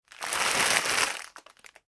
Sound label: Plastic Packaging Movement